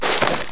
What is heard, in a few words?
mac10 equip, weapons